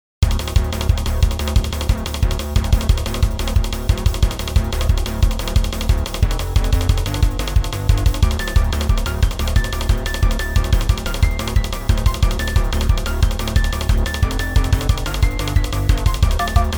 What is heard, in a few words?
keyboard Battle